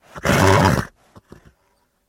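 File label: sharply blows excitedly, horse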